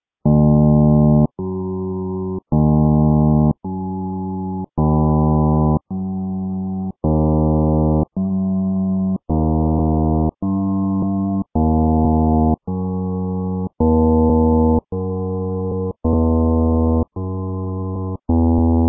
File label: didjeridu